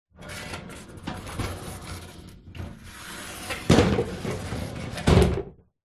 household shower door plastic glass slide open close; zapsplat